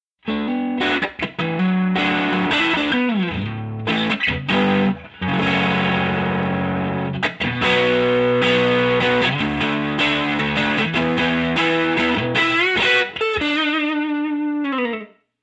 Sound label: overdriven